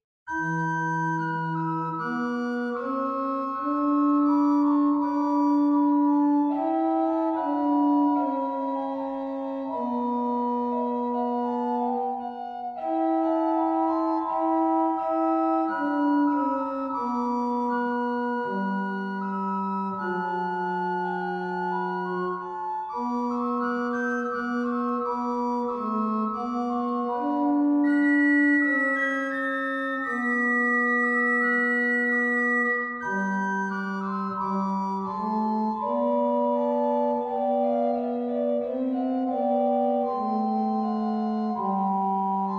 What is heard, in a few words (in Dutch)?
improvisatie psalm 5; krewerd